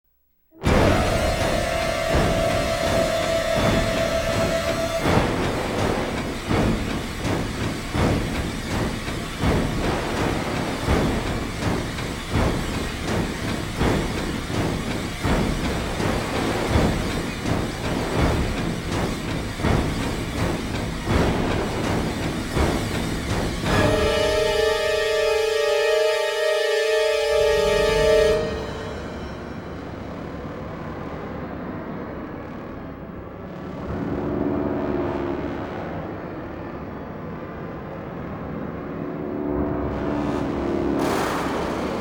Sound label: no attack strings